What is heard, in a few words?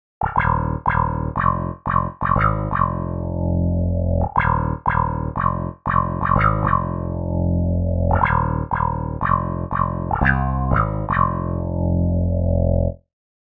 Plex Bass